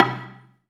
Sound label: BELA2 C2, BELAFON, Asian Traditions; USB Soundscan vol.60; AKAI